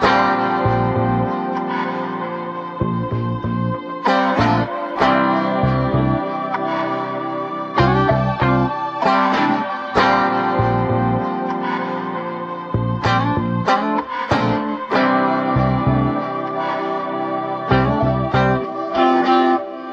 keys and guitar, loops, phonky

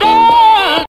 dahuh SUNG; ooh ahh

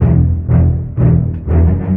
bass, Loops

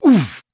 oof, human; Voice; ooh ahh

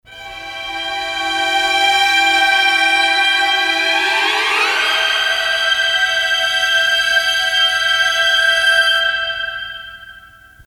string dissonace

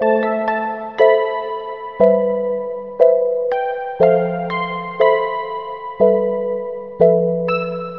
keys, Sad

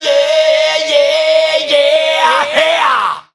"yeah yeah yeah heyeah" SUNG; ooh ahh

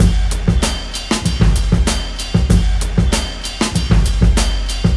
fuzz, funky drum loops

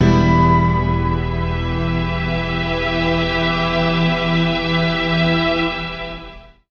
oboes

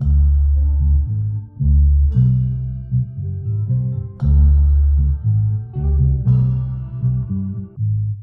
bass, Loops, Phonky

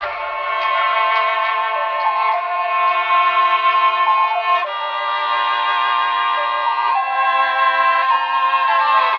strings, Sad

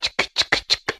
chicka chicka chicka; ooh ahh

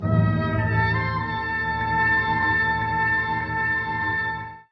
horns, Loops

